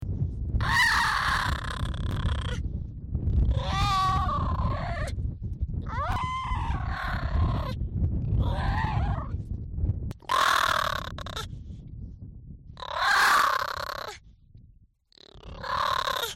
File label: harp seal